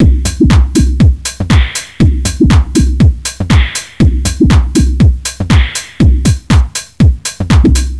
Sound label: 4 On The Floor; Sonic Foundry; Crimson Blue and Fabulous Horncraft 4 RnB; aciddjexpander